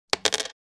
Dropping a coin on a table

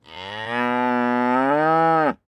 Bull mooing

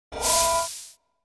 Sci-fi hydraulic door opening or closing

